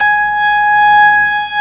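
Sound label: ORG B3 G#5, organ; Best Service Gigapack 2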